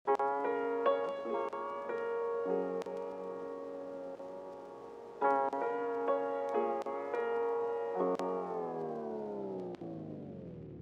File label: Vinyl Piano Sample; Izotope